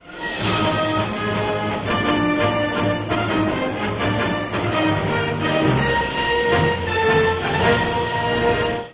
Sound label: ringer; Ringtones